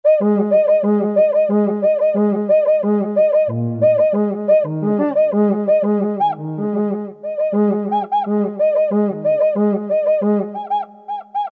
cuica, Latin and F.X. Percussions; Roland SN-U110-02

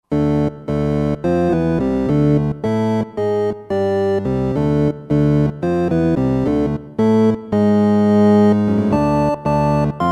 Farf; '60s & '70s; Iron Farf; Roland SR-JV80-08

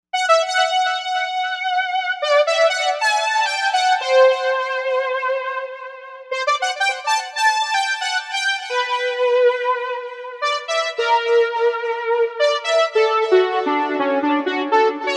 Shiney Lead, Novation UltraNova; Factory Patches

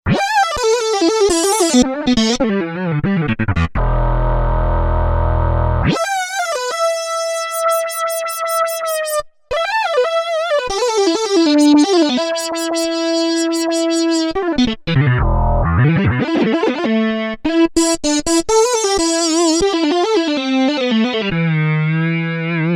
modular lead, Korg DW-8000; Factory Patches

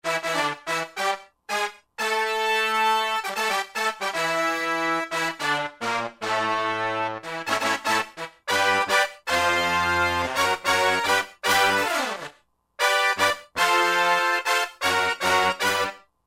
Session Brass, Roland JD-990; Factory Patches

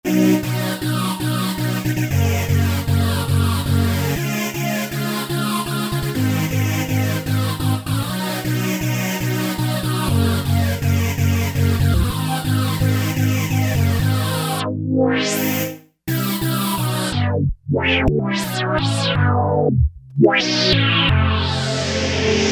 Fuzz Triad, Novation XioSynth; Novation XioSynth 25

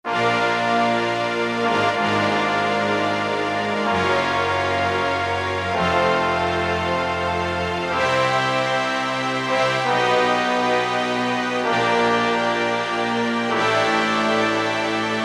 ens - Symphony, E-mu Proteus FX; Factory Presets

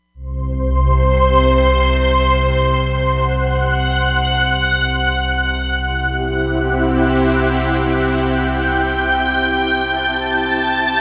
Strings Pad, Roland Fantom XR

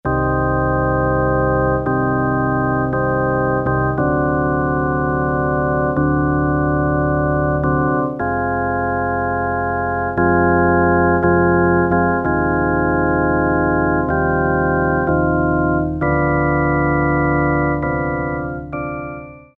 PIPE ORGAN, Yamaha PSR-300; Voices

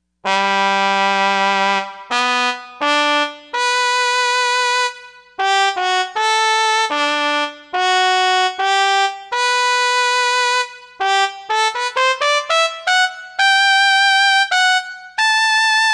Trumpet, Yamaha TG55; Factory Presets

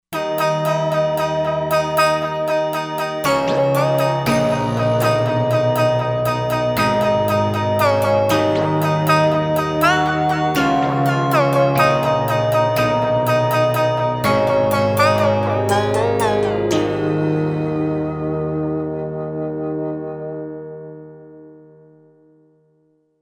Wired Synth, Roland Fantom XR

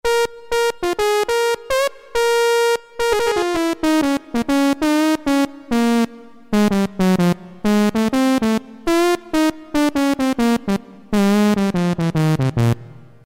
Spit Valve, Roland MKS-50; Factory examples

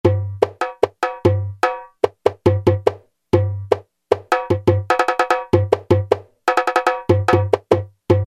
Arabic Dhohola; Yamaha A4000; World - Latin Instruments; Arabic & Gamelan

